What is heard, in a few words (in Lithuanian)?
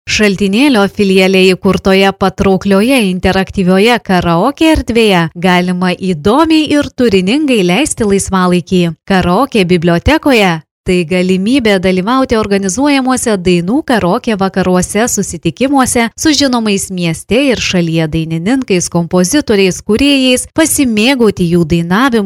Karaoke erdve